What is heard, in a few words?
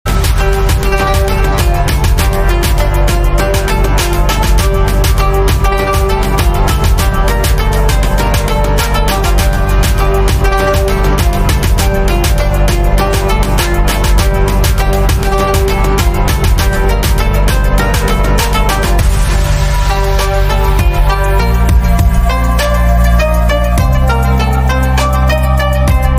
Instrumental